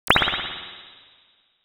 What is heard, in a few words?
complete, spring